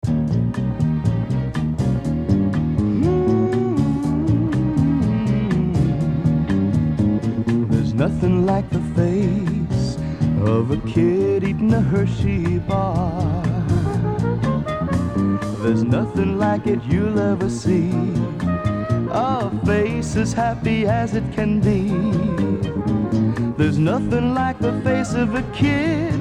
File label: rhythm & blues; hershey